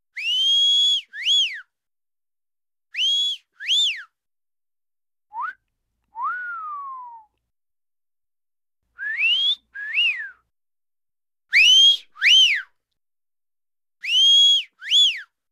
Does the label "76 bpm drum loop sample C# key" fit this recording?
No